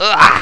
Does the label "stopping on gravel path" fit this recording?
No